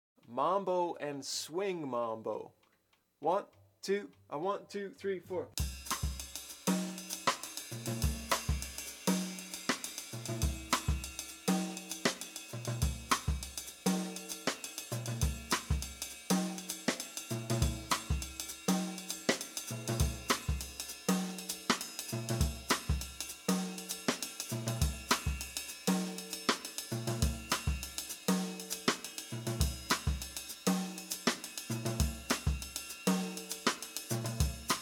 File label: Mambo, Bop Drumming; The Art Of Bop Drumming; Riley